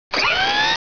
Mecha Spin Up

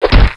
punch

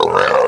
pig idle

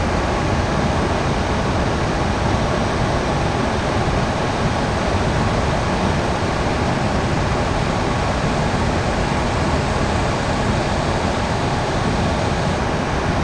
wind outside

